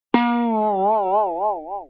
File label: twang; disney; mashup